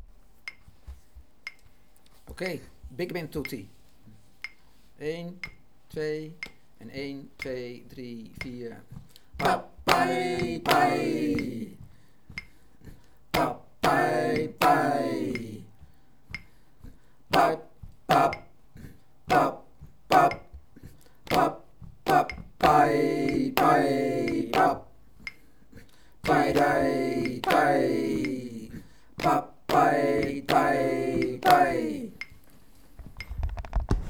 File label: big band tutti medium